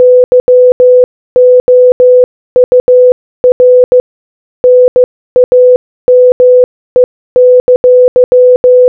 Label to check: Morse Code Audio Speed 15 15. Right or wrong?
Right